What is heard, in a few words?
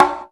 Conga Rim Hi, Drum Machines; EMU Planet-Phatt